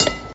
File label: ding; minesweeper